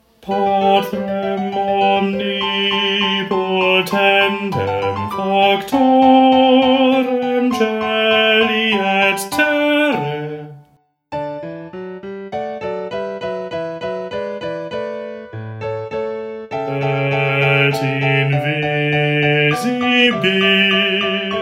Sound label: Bass